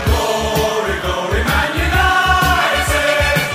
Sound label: chants; ggmu